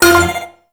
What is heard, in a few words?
moto race countdown; Warframe; Motorcycle Racing